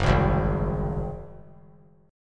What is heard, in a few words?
F sharp Suspended 2nd Flat 5th F sharp, Chord; strummed; JGuitar